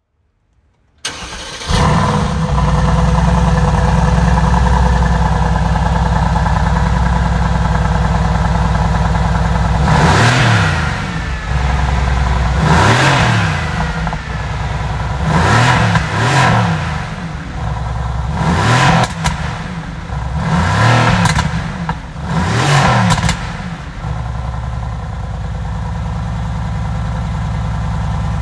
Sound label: mercedes benz gts; mercedes benz amg gt s